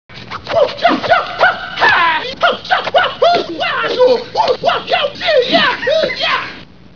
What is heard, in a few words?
karate